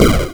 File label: death